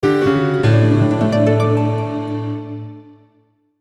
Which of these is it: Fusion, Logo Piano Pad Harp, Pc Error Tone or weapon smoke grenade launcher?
Logo Piano Pad Harp